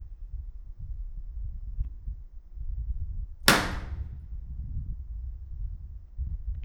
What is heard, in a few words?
under stairs